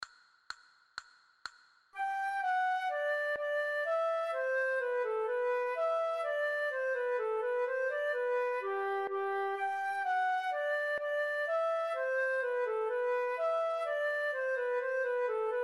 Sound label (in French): Gigue, flute a bec